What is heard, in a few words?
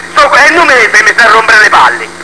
Ooff